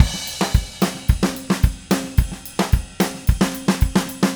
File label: Red Maple Groove 110, DW Red Maple Kit